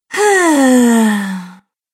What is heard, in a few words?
Woman Bored Sigh; Recorded Voice Sound Effects